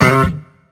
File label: Guitar